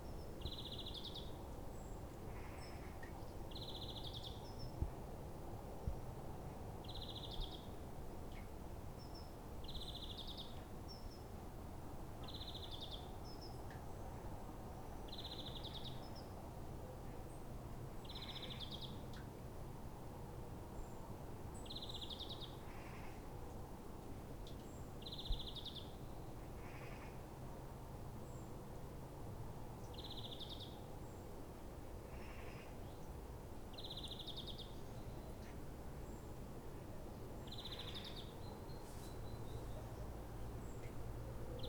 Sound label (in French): Oiseau